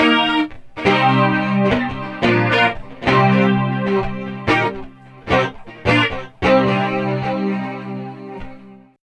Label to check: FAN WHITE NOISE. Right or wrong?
Wrong